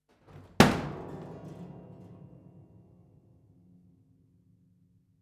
Push Over, Piano Destruction

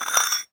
Hole Saw Scrape; Hole Saw Bits

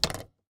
Typewriter

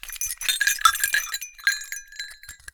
Cowbell Chain, Percussion

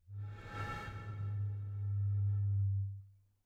Bottle Blown

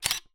Camera Mirror

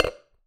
Tap Glass, Medium Wine Glass

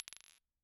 Bottle Struck

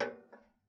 Ping, Kitchen Toaster

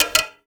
Classical Guitar Extended Technique

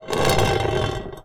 Drag, Vase

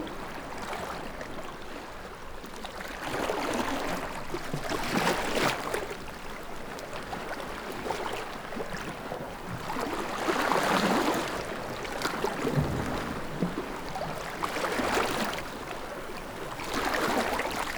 waves

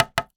Polystyrene Creak

